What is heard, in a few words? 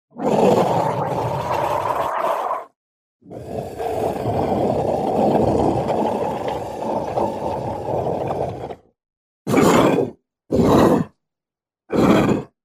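sounds of demon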